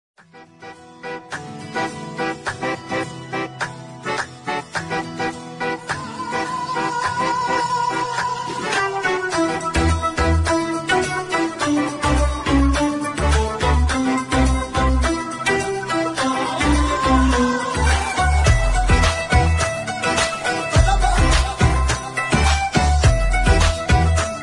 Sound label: instrumental ringtone love song ringtone; baadshaho